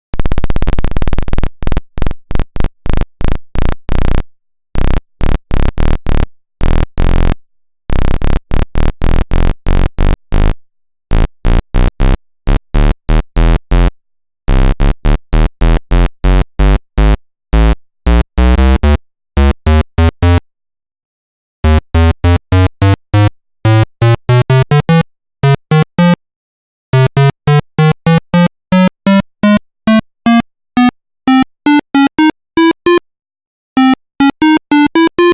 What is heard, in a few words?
8-bit